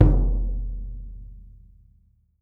LRG WOODE, ETHNIC SET; Voice Crystal - Steve Reid Definitive Percussion

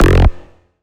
Bass F, Bass Synth

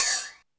open hihats, techno; Techno ID

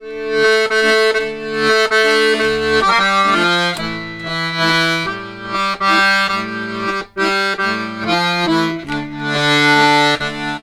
Accordions; USB Soundscan vol.40; AKAI

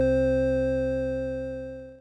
synth, amiga med

